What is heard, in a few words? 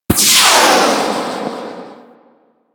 RPG Rocket; Hellion Elite